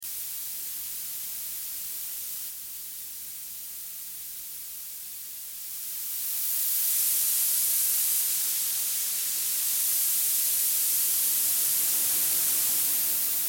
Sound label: noisy bug; modius13